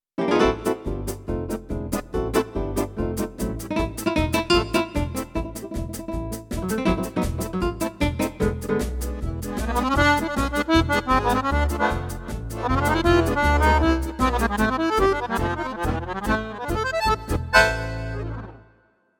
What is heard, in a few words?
Gypsy Swing